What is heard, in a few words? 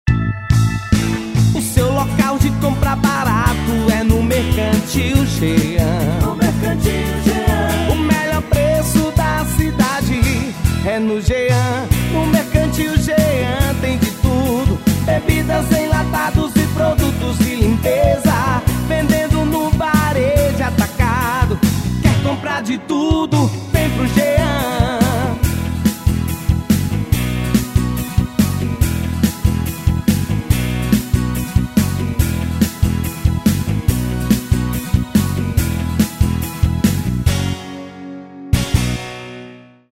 jingle; MERCANTIL JEAN